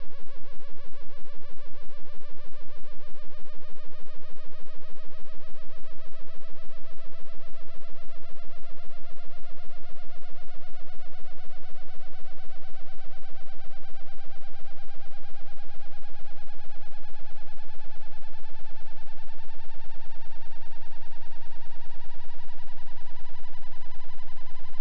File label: sweep; stuttgart